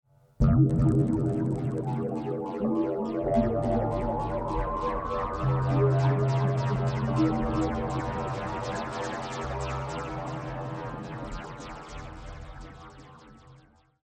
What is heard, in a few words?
iridium dry